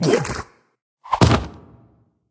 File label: Drink and fall; Level4 Barney